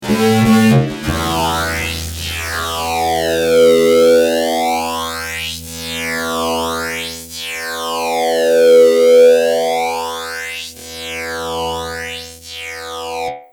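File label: fuzz guitar, ADA flanger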